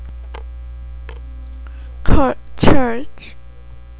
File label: charge